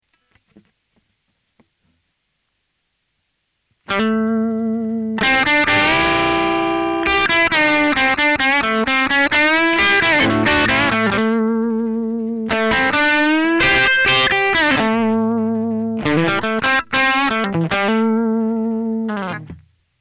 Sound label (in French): Test Solo, Amplug AC30; Le solo du mix